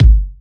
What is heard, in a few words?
rich low end bass drum sample g sharp key; Duration 0:01; Samplerate 44100 Hz; Bitdepth 24